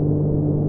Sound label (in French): Climatisation, TGV; OpenBVE; Train; UM